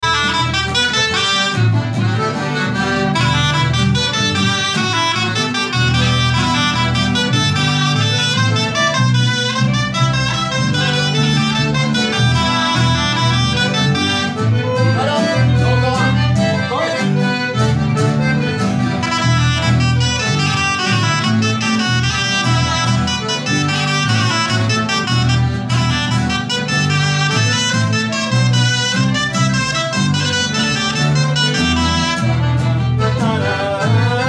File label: bombarde